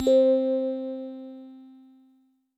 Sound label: Synth Prophet One Shots, Keys C; Electronisounds